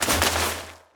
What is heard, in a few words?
Bodyfall Snow; SFX Kit